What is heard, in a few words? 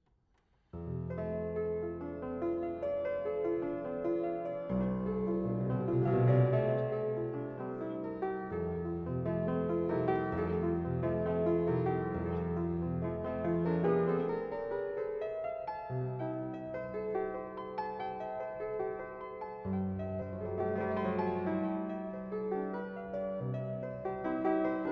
Preludes Op 28 No 23 in f major, Piano; Frederic Chopin